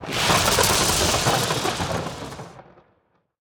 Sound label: Lightning Underlay